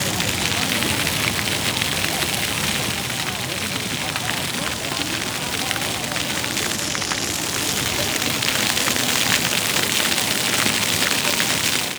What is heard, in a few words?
Hermann Park Fountain, water; HOUSTON